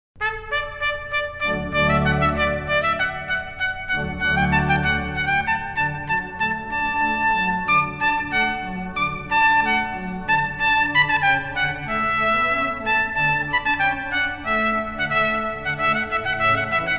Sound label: TPT; wedding